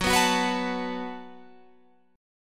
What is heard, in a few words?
Gb Minor Double Flat 5th, Guitar Open G